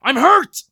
hurt light; Escape from Tarkov

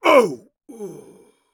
death; Escape from Tarkov

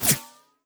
bullet whiz; Escape from Tarkov

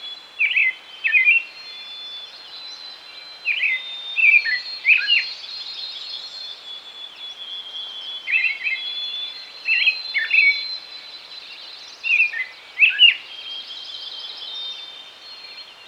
Amb Animals; Red Orchestra Ostfront 41-45

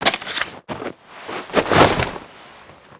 turnbuckle knife mono

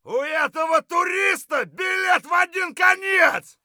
fight; Escape from Tarkov

